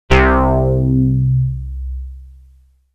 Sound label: Moogli-Bass